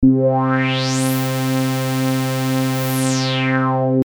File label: LPF Res